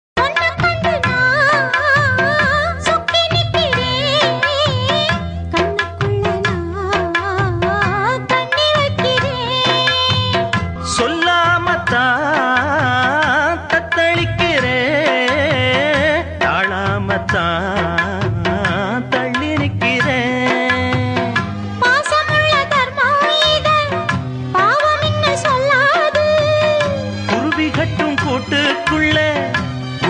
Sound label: Tamil Song; Muthal Mariyathai